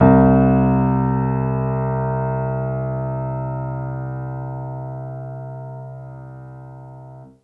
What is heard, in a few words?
Piano Upright c1 med; TrashLibrary